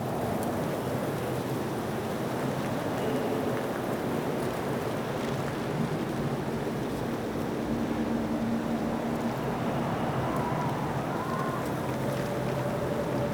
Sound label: Outpost Defense Ext Amb; Warframe; Corpus